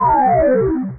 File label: SWEEP DOWN